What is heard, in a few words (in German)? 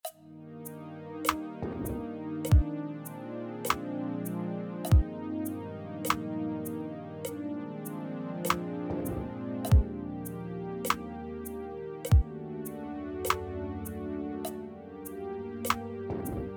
50bpm, schlagzeug